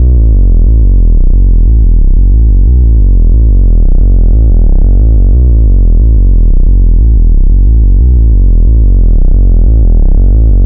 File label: Synth Bass